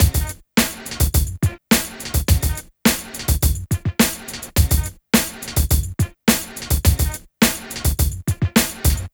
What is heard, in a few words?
Club Tip, R&B Drums